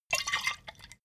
bottle vodka shake single; berufe

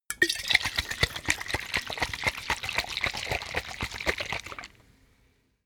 bottle vodka shake fast; berufe; gastronomie

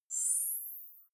navigation point

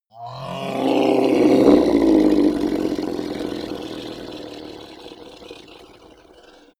hellhound roar growl; kreaturen

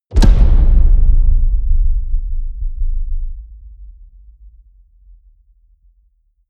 drum hit hollow large; music elements; percussion

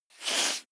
nose sniff; soundpool

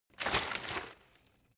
bottle water sparse shake single; berufe

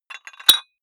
dumbbell put on very small weight hit; sport freizeit; fitnessstudio

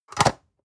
storage lid slide close hard, box; objekte

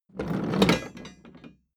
metal box roll smooth; maschinen; soundpool

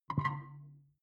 click beep bass tumble evil; kommunikation; signale

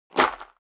step high heels gravel light jump; schritte; menschen

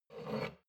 shot glass grab table slide soft; berufe; soundpool

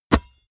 basketball catch hard heavy

